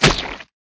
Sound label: pumpkin smash; Angry Birds Seasons